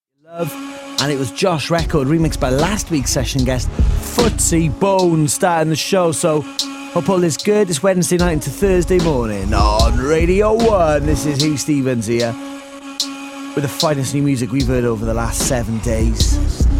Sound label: bass